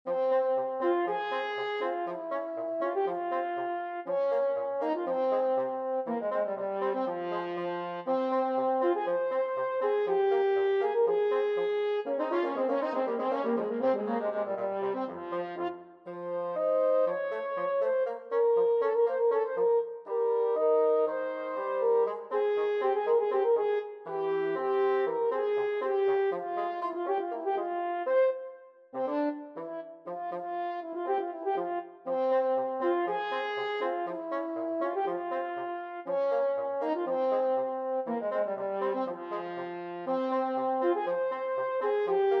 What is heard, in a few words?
brahms hungarian dance, french horn bassoon duet